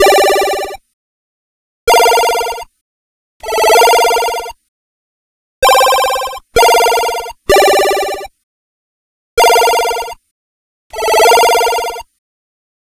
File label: Game Boy Chord Loop, Nintendo Game Boy Synth